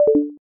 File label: power unplug